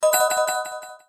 Combo

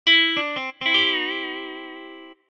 Game Lost; TianDaKeng

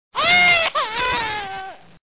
lose; DDPeng

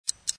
Game Warn

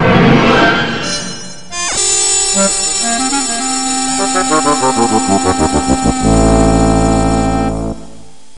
GAME LOSE

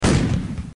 gunfire; fishing3

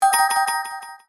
Combo

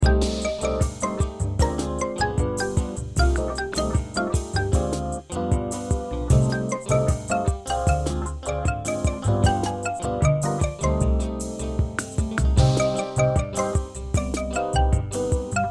back music

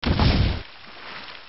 gun, gunfire; fishing3

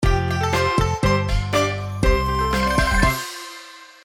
Win; SoloOx

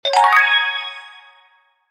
Game Start; TianDaKeng

